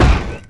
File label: heavy concrete, footsteps; player; heavyfeet; Dystopia